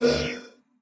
Shield block

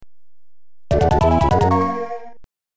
level 3 - completed, soundtrack; level 2 - completed

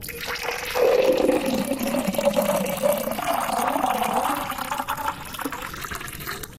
Bloodlust Fill

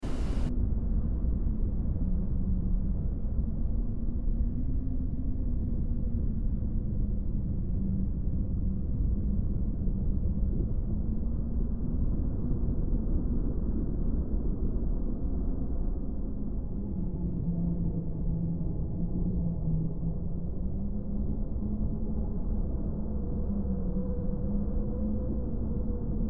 House Ambience; escape the backrooms; Level 9

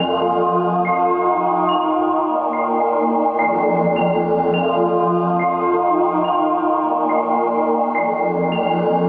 Crystal Loop Ambient

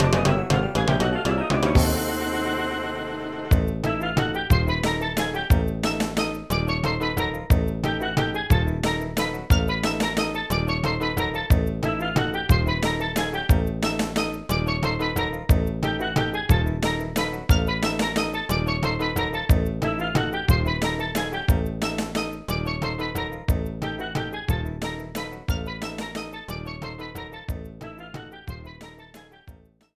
Double Bogey (Fanfare); Mario Golf Toadstool Tour

